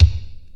old school short reverb kick drum sample e key

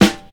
natural steel snare drum sample g sharp key

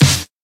loud snare one shot C sharp key

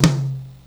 hi tom drum c sharp key

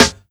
smooth snare single hit E key

